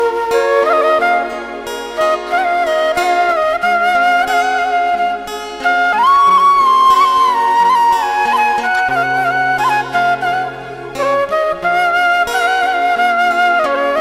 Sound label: Instrumental Flute Ringtone